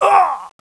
pain; bts rc; construction

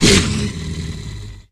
pdog hurt, pseudodog; russian; sven; russian sounds

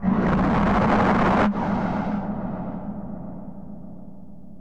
teleporting

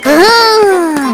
broom; kujoukaren

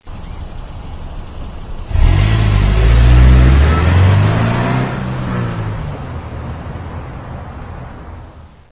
cars; sven; labbreak

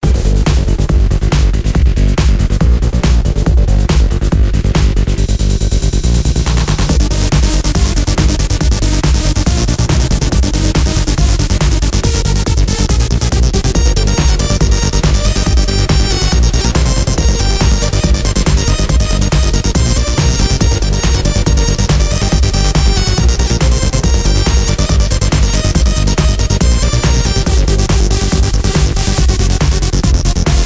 bonus round

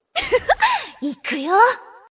rpg reload; sven; player; Miku verano

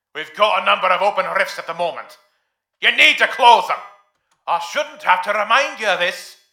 reminder rifts

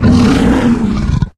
giant hurt; sven; russian sounds

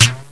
twang, tether

